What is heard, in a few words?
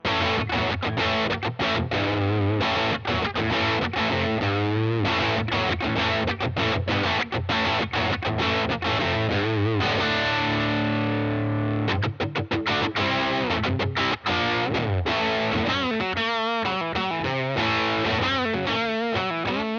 Big Crunch Bridge, guitares; Apogée kit micros HepCat PAF position chevalet « big crunch; berg guitares